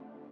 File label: lead